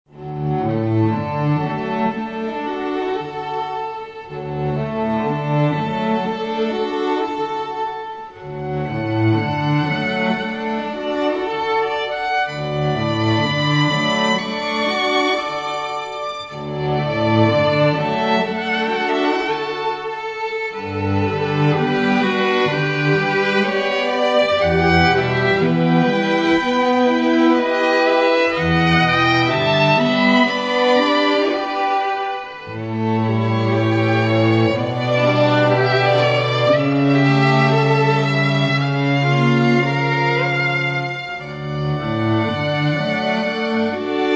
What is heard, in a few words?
Quartet Meditation